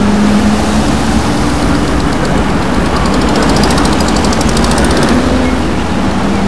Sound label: digger; terminus